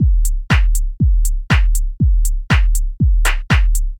Hard Tech House

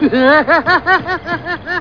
Clown Laugh; Krusty

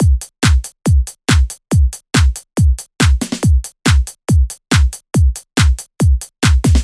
Happy House 140

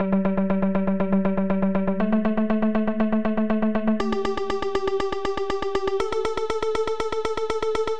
Pizzicato

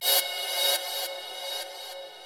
Pan Reverse Cymbl

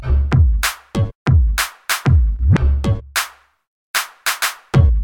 New Skool Hip Hop

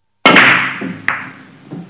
Pool Hall Ambiance

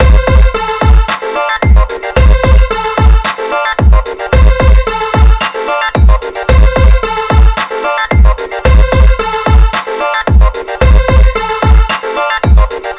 Pop Beat

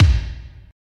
Kick Drum Reverb

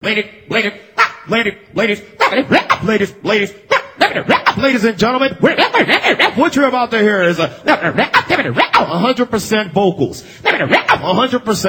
Human Beat Box

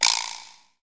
Korg Vibra Slap

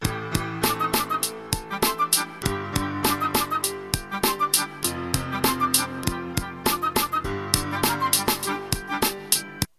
Hip Hop DJ Juggling

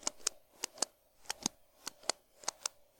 Pen Clicking Sound

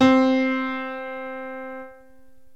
Piano Key C

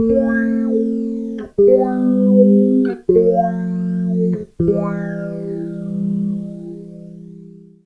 Guitar Chords

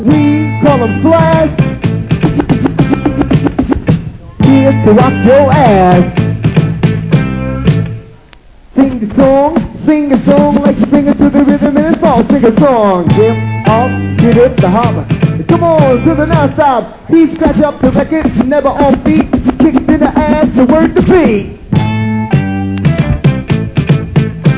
Old School Hip Hop

